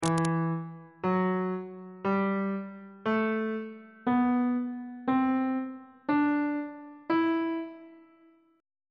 d double sharp minor scale note positions on bass clef